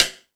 Percussion FX, Drum Machines

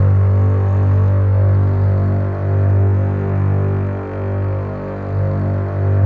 Contrabasses G0

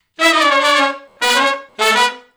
Funk Riff (A) unison, Horncraft for R&B; Sections; Crimson Blue and Fabulous Horncraft 4 RnB

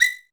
Korg 01W Percussion

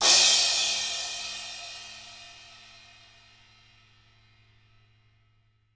Hanging Crash; Drum Hits; Crashes; Nu Dance Electro; Zero-G; Soundclash

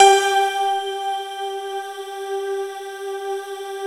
JV-1080 BELLS PNO; PIANO-EXP; Syntec; Wall of Sounds

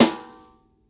SD, dry kit, Percussion

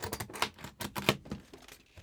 SUITCASE, Tools; AKAI S6000 CD-ROM - Volume 6

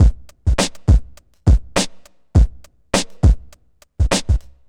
Drum Loop, Drum Loops; Sonic Foundry (Sony Creative Software); Leo Cavallo Bass Taster

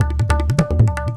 Tabla; Sonic Foundry (Sony Creative Software); World Pop

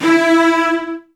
Virtuoso Strings, TRM FX

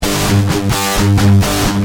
Guitar Heavy, Industrial

